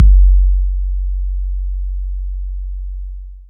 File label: Pure Sub, Kick Drums; Zero-G; Soundclash